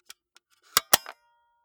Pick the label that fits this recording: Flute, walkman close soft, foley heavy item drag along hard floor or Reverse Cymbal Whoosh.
walkman close soft